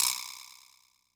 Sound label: VIBRASLAP, Yamaha DD-65; PERC